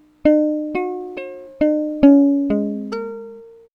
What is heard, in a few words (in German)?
Zupf, violin; Model B; Physical Modeling of Musical Instruments